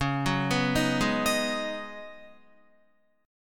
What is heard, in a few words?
Db 7th Suspended 2nd Suspended 4th Db Guitar; Guitar-4F,4A,5C,5G,5C,6E; Db7sus2sus4 arpeggiated